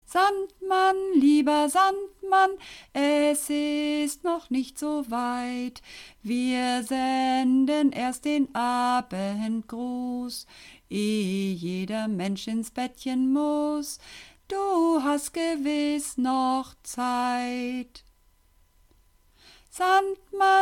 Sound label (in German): Sopran, Uebungsaufnahmen